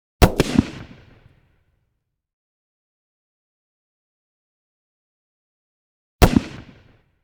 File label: .308 Blast Close; Hollywood Edge; Heavily Armed